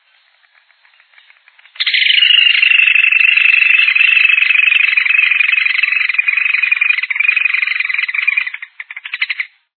siricora-mirim

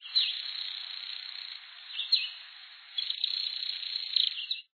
pica-pau-anao-barrado

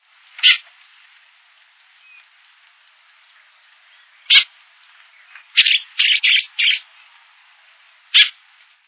bico-de-veludo

